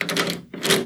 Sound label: slots Go